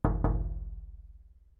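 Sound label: Knocking On A Window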